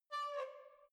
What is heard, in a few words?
letterbox metal pull open soft